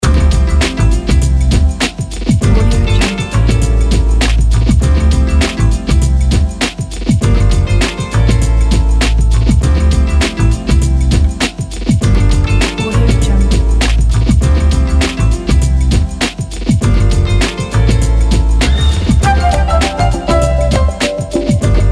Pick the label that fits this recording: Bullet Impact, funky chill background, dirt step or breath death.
funky chill background